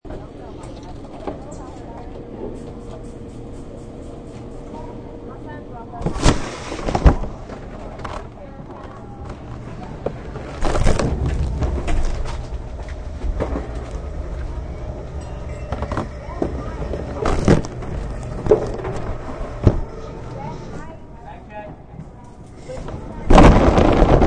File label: Inside Airport X Ray, Unique recording of the inside of an airport security X-Ray machi; Unique recording of the inside of an airport security X-Ray machine. The recorder was actually put through the machine